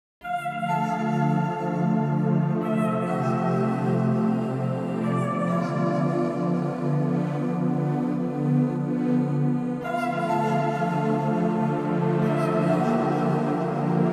Arturia V Collection 9 Augmented Voices Strings Intro